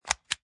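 Hand Gun Clip Load, Insert clip, ammunition insert, reload hand gun; Gun Handling Sound Effects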